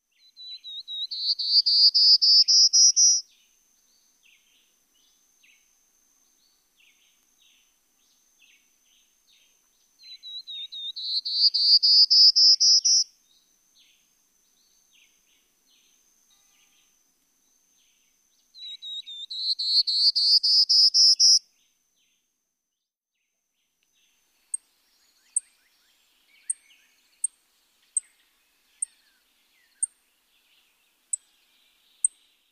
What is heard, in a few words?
Prairie Warbler